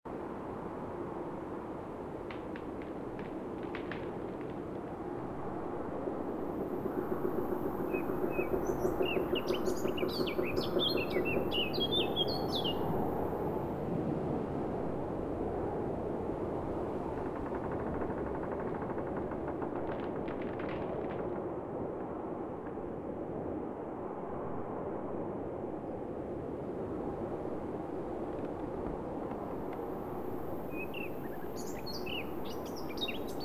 Winter Forest Ambience Loop; UltimateFishing2020

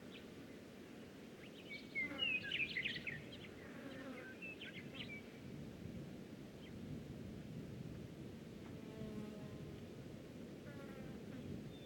Forest Woodland; Loop; UltimateFishing

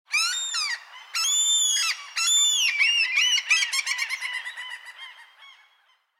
seagull; UltimateFishing2020

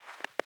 Soft Snow Footstep; UltimateFishing

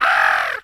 ANIMAL Bird Crow; mono; UltimateFishing

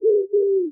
ANIMAL Pigeon Call Short; mono; UltimateFishing

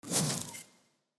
Ranking rewards; UltimateFishing2020

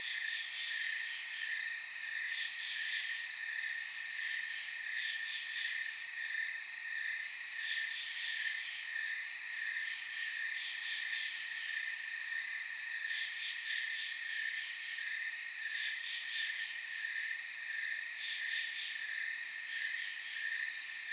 Amb Forest; UltimateFishing2020

